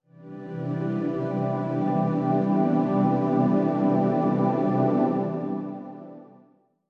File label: Link Sting